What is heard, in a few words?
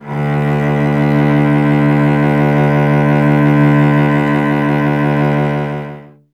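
strings cello D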